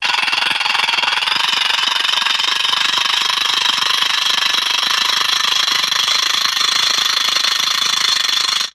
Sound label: Ratchet Wind Up Fas, ratchets